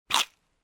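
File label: short bite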